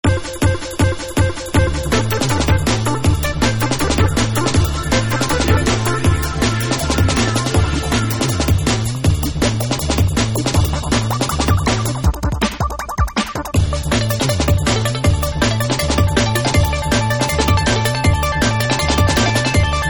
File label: drum and Bass